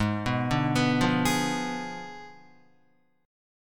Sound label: Ab Diminished 7th Ab, Guitar, 4F, 4A, 5C, 5G, 5C, 6E; Abdim7 arpeggiated; JGuitar